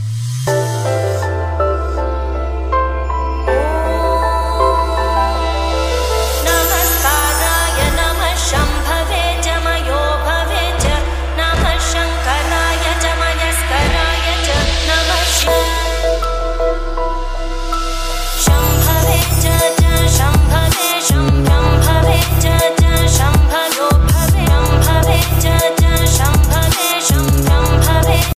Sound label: Trap